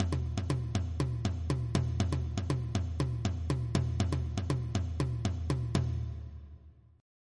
gahu, traditional rhythm; Gahu and its right shift by 14; Creating Rhythms

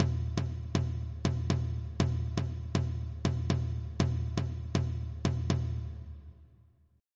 son clave; Creating Rhythms

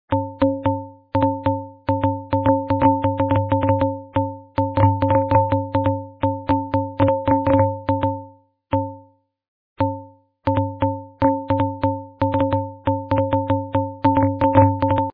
rain tin can

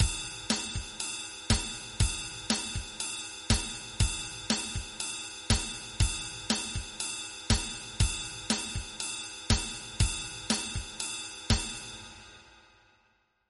cajun zydeco, rhythm; Creating Rhythms

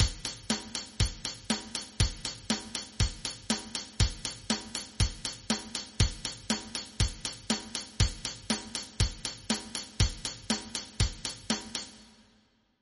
rock, rhythm; Creating Rhythms

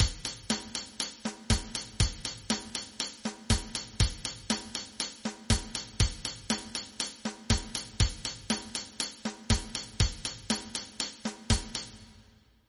hiphop; Creating Rhythms

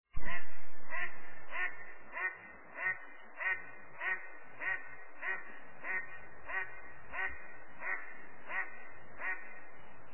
ducks

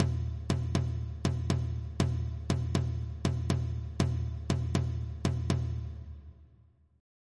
shiko, rhythm traditional; Creating Rhythms

